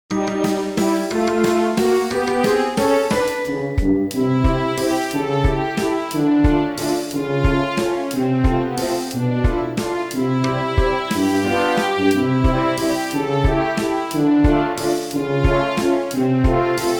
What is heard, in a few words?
toy waltz loop